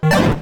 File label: sound explode; godot; platformer